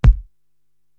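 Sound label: BD Thumper, bass drum, drum machine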